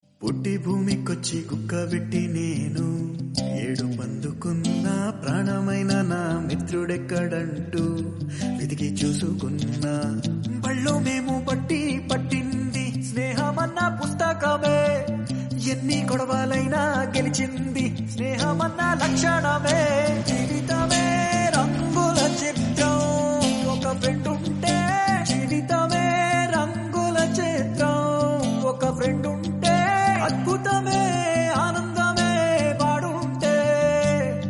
telugu song

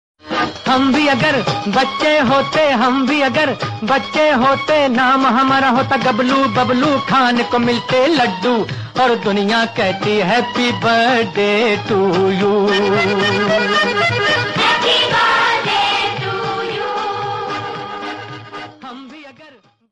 happy birthday to you mom birthday

